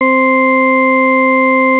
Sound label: organ, SQ80